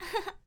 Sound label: Girl Laughing